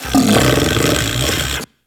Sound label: Kubrow Tame Sleep Idle Snore C; Warframe